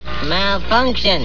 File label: pain; alienarena; johnny5